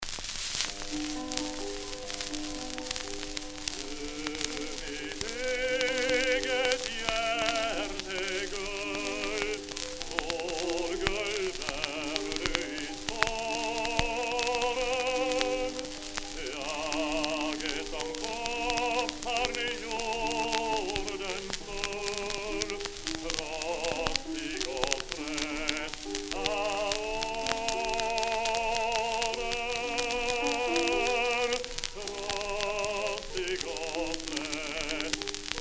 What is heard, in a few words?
Gramophone